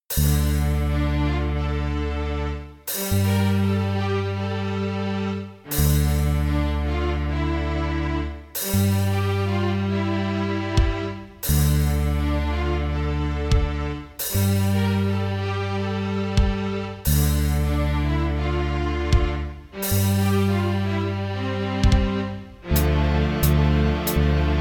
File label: D Minor Strings